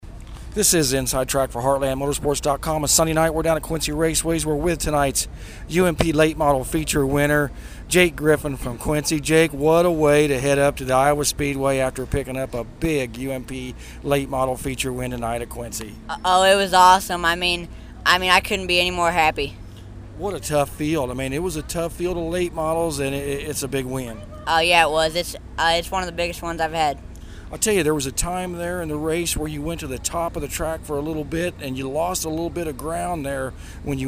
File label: UMP Late Model; Quincy